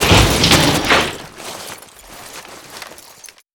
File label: big jump land; Modern Warfare